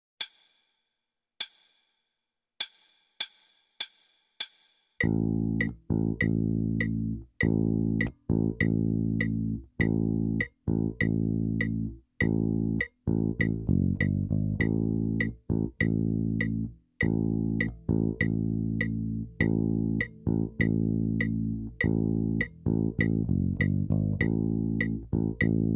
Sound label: Rock